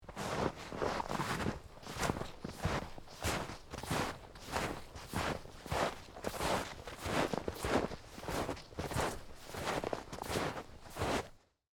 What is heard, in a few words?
Snow Walk Deep, Footsteps, Snow, Deep, Walk, Through, Forest; BlastWave